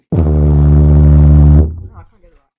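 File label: sharp attack sustain, tuba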